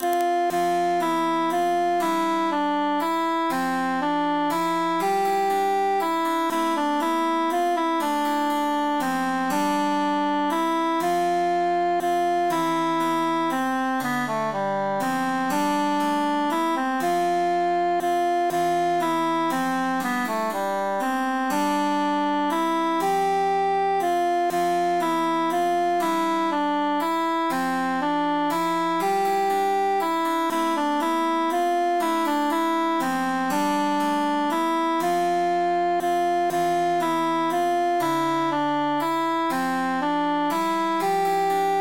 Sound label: cantus emphasized; li jalous par tout sunt fustat tuit cil qui sunt enamourat veritatem